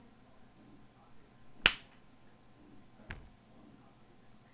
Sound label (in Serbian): pljesak